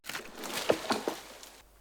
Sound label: Cutting Down A Tree Falling To The Ground